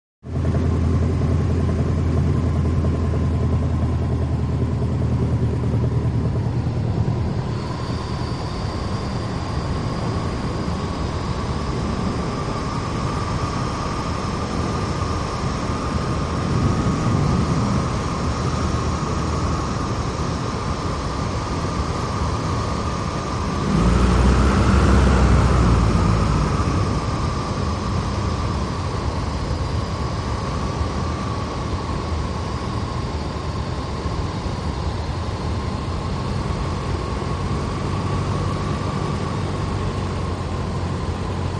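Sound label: Gliding / Throttle Interior; D.H. 82 Tiger Moth. Idling With Occasional Revs, Loud Air Whistling In Cockpit, Some Gusts. Close Perspective. Prop Plane.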